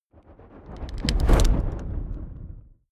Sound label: fire whoosh crackling soft; swooshes-swishes